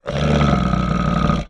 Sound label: animals bear growl grunt